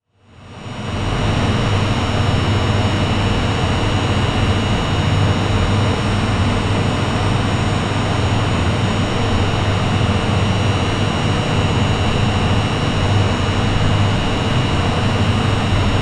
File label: Wind Energy Plant Inside Atmo